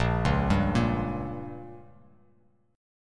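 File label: B flat Minor 6th down; arpeggiated; JGuitar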